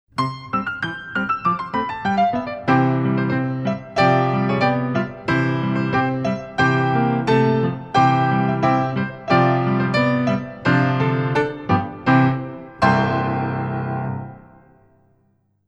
Petit Allegro Solo Version

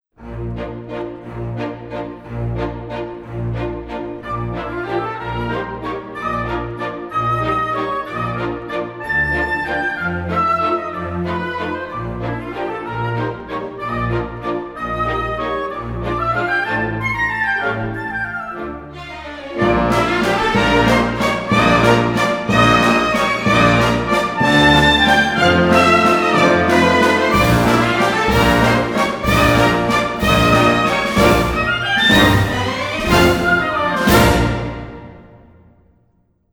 Grand Allegro Continuous Pas De Deux Variation; Swan Lake Pas De Deux No19a Variation 1

